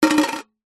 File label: foley coin down on thin metal surface